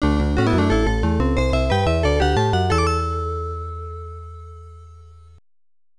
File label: Victory; Golvellius Valley of Doom; Master System